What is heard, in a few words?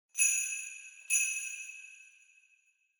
Sleigh Bells Knock Hard Short